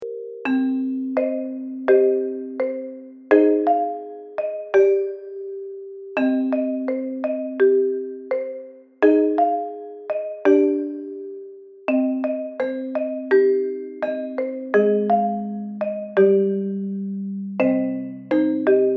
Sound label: Lullaby Arrangement